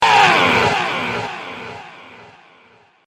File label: grunt